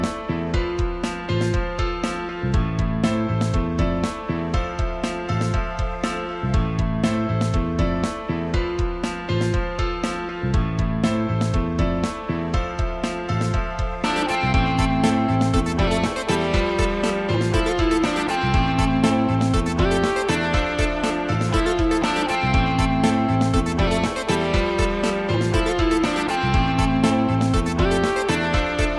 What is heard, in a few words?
instrumental